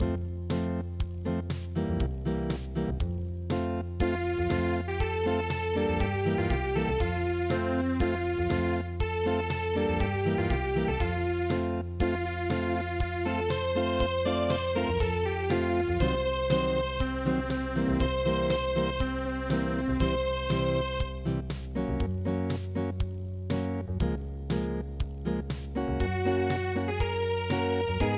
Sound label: Unplugged; ALOUETTE GENTILLE ALOUETTE